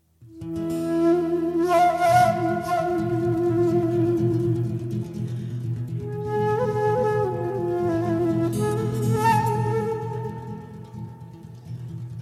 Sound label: Hava Naguila (instrumental)